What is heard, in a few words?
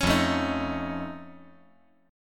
F Augmented 7th F, chord